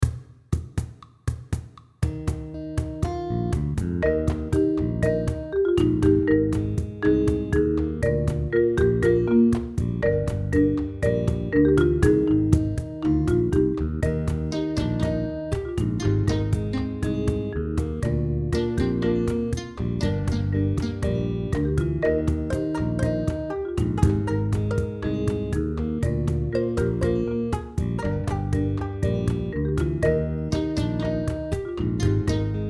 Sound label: West African style